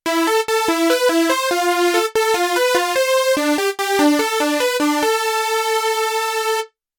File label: osc filter env lfo; Lead-Sound erstellen am Synthesizer